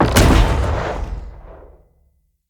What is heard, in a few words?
Siege Engine Cannon Fire; Weapons; Enemy; Artillery; Ode Siege Engine